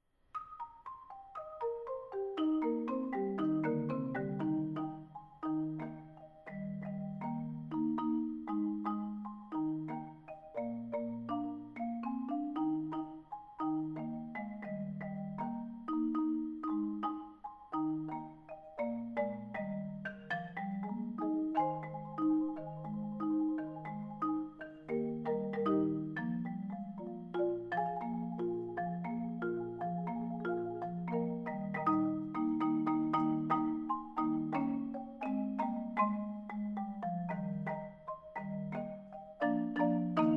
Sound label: Merry Mallet Music